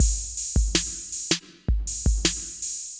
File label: Synth Loop, BPM 80